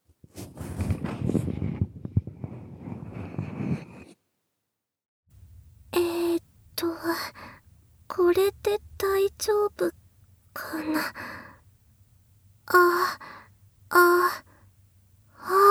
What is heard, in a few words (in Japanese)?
耳舐めASMR練習; 超舌耳舐め×密着吐息; KU100